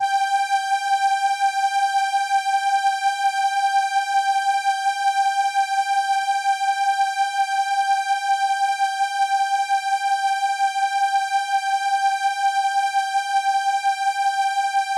Viola G6, ARP Solina

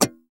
GTR SCRAPE, Clean E.Gtr, Strats; Roland LCDP02 Guitar and Bass; PYB Strats

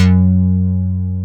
Dynamic Bass; Best Service Gigapack 2

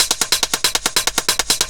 Fill, Drums, Eclectic Electronica

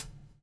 BUBL, BUBBLE; Spectrasonics - Backbeat

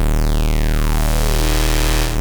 OB D#2, VOX, Synthesizer; Hollywood Edge - Giorgio Moroder Rare Synthesizer Collection; OB 4 VOX.

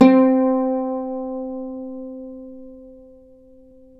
Celtic Harp, Plucked Strings

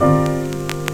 HIT, Hits & Brass

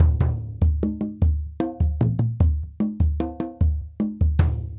African Drum 75 bpm

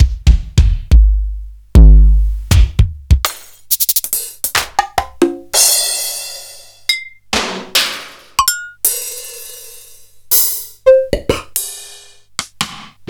Alesis HR16A

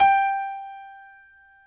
PNO XYPNO, Grand Pno, Roland; Club-50 - Foundations; Grand Pno 2

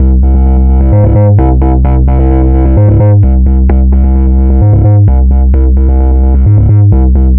Bassline; Basslines; Drums & Drones

